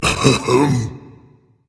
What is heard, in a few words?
clear throat berserker; cuchulainn